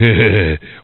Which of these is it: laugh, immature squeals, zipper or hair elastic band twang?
laugh